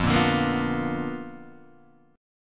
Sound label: B Minor Major 7th Flat 5th, chord